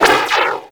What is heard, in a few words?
ring bounce; Universe Ring; Warframe; Nezha